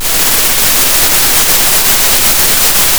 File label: Sound of a thunderstorm